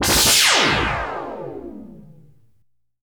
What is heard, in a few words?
BURNER, LASERS STABS; ILIO; Trance Fusion